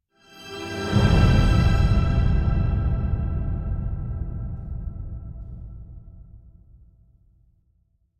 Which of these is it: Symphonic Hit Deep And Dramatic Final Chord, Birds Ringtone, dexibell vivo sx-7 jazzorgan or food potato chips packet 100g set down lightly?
Symphonic Hit Deep And Dramatic Final Chord